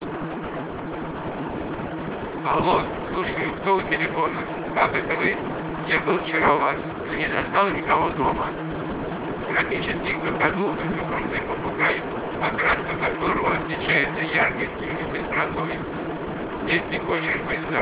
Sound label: car +03dB